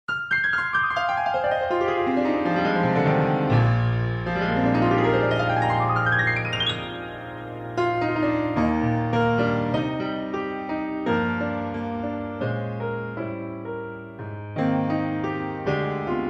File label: Cinematic Grand